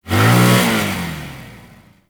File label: Infested Hammer Rev; Weapons